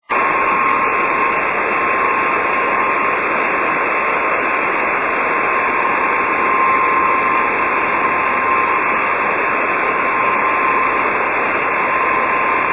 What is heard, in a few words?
10 4G beacon